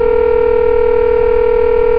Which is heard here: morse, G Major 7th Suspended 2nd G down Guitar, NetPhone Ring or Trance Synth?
NetPhone Ring